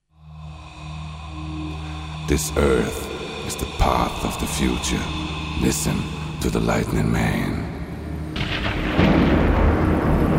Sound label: lightning